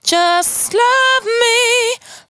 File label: 92bpm Bb, Velvet Vocal; Zero-G